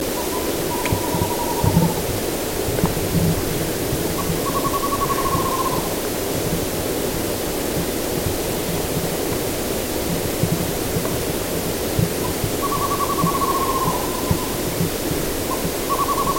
Western Screech Owl Megascops kennicottii

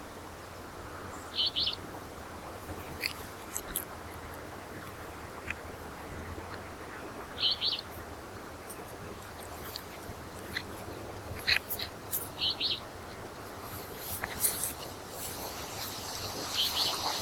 Alder Flycatcher Empidonax alnorum

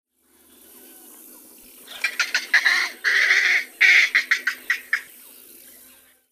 Green Heron Butorides virescens

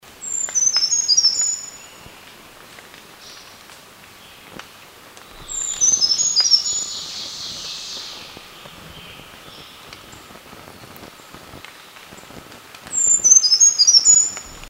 Brown Creeper Certhia americana nigrescens

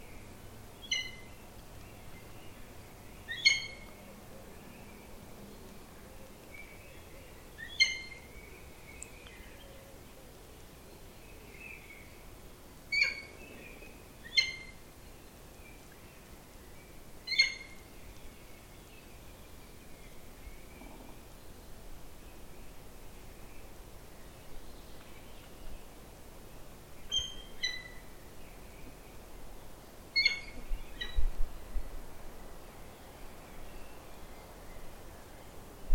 Peregrine Falcon Falco peregrinus

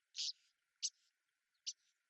Common Starling Sturnus vulgaris

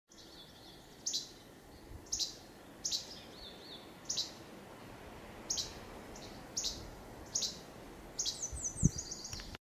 Least Flycatcher Empidonax minimus

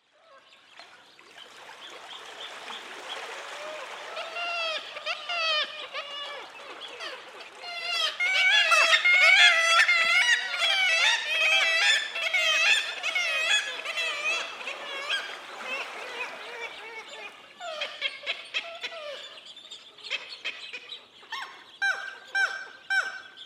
Black legged Kittiwake Rissa tridactyla

